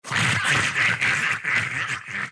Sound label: gargoyle bat